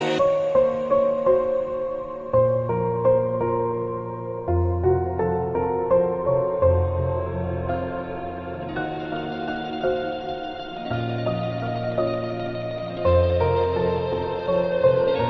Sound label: Ringtone